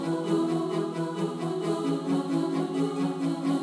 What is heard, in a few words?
the cave music lilypad; fujii ps4 windows gamerip 2019